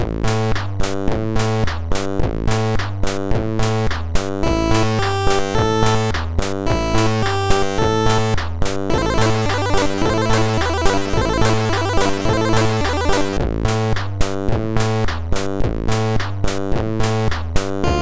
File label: MOS 6581